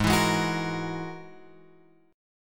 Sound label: G sharp 7th Suspended 2nd Sharp 5th; G#7sus2#5 Chord {4 7 6 X 7 6} strummed